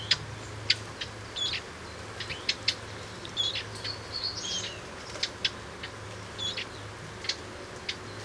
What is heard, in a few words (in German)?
Moenchsgrasmuecke; Murnauer Moos; Michle Peron